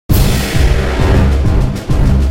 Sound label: Fight Lose; music; Silas; The Da Vinci Code; XBOX